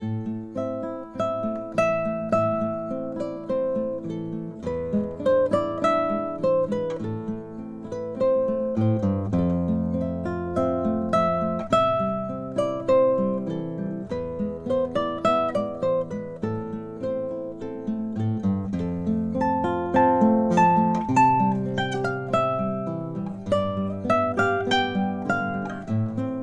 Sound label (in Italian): melodia stereo